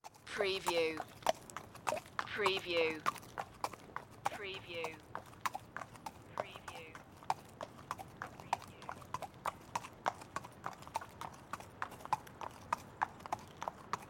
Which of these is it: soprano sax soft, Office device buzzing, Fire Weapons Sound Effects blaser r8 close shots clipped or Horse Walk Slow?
Horse Walk Slow